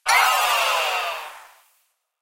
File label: gambler lose; Hot Party Deluxe; Wazdan